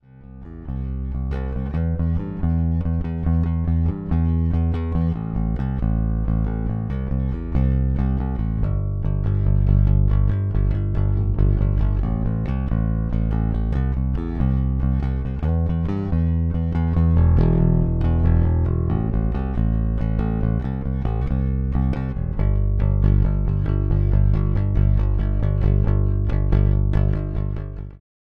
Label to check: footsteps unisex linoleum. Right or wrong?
Wrong